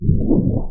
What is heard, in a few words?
teleport; scourge